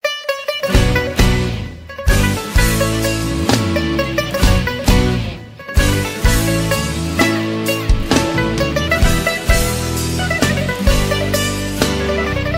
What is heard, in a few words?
Zeibekiko; Kids Open Solo; Kids: 7 – 11 yrs; Rizoelia Banquet Hall